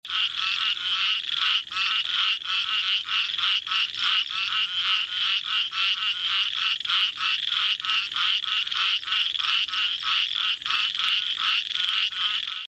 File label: microhyla butleri